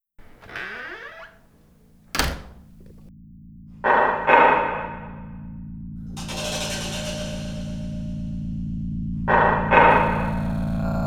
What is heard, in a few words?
MRI